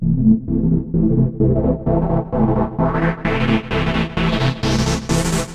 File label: Kaossilator gated (130BPM); musicradar